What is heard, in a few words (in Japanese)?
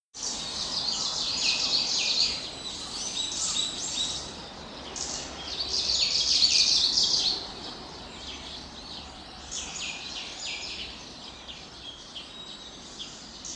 オオムシクイ